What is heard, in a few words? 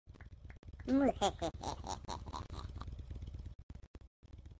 laugh; most devious roblox